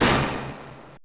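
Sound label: Orchestra Hit; Genesis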